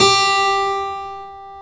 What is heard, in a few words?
KEY HRPCR, Roland; Roland - LA Composer vol. 1